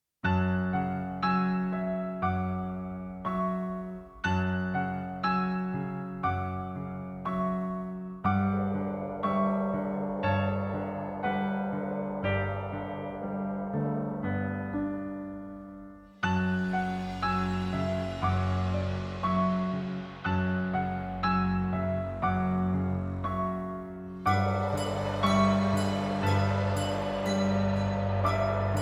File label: tormented; halloween